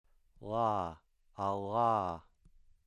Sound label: voiced velar lateral